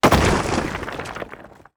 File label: objects, World of Tanks